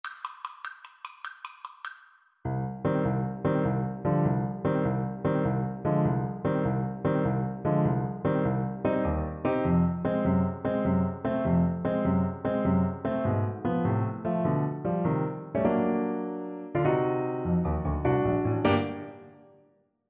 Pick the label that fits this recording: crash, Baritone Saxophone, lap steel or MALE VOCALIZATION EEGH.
Baritone Saxophone